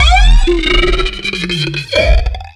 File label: alien generator